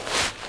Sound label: fs sand, singlesteps